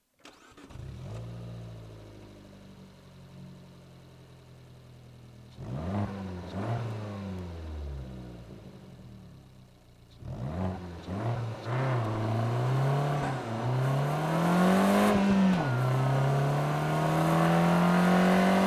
Fiat 500 Abarth

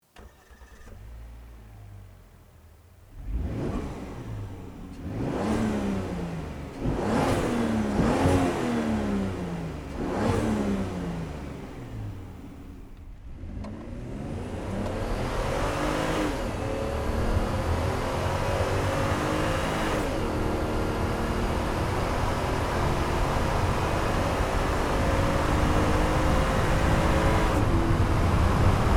Volvo S60 T6 AWD

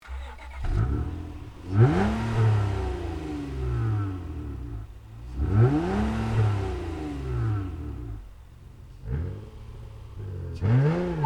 Honda Accord V6

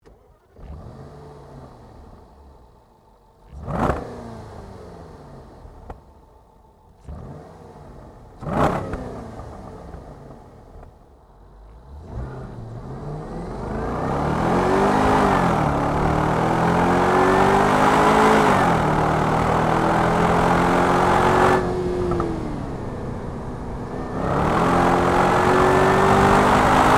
Jaguar XJL Supersport

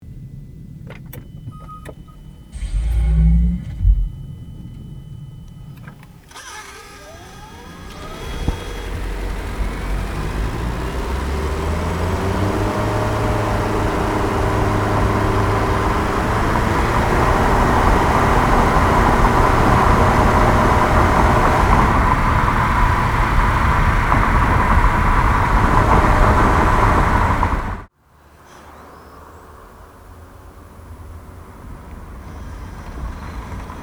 Chevy Volt

